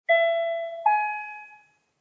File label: elevator